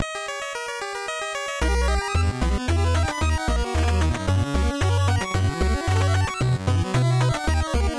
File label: title screen; die-hard